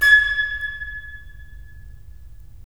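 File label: FL, flute; LA Composer vol. 3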